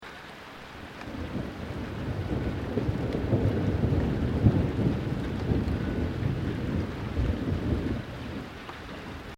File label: THUNDER; Elemental weather effects; Thunder (Sound Effects:Weather)